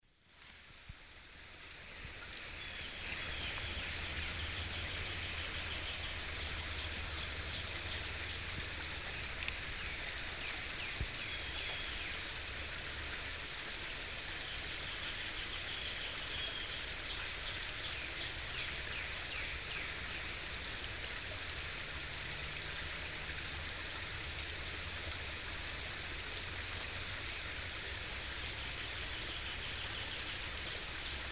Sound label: running stream with birds